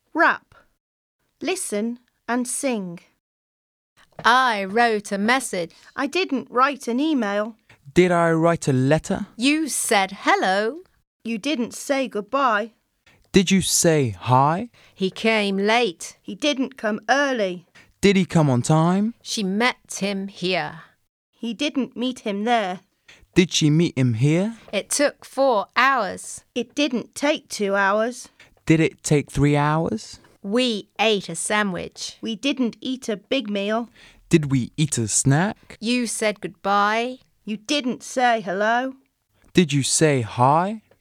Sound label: Rap song